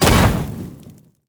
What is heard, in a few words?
sfx towers cannoneers incendiary Ammo impact